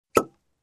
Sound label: Cork Pulling